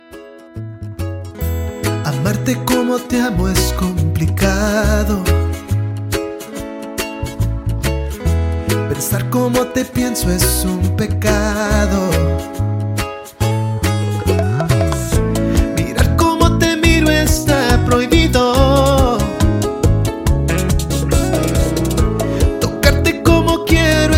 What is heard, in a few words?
Bachata